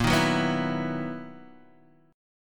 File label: B Major 11th A sharp